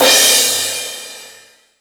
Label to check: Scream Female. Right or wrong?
Wrong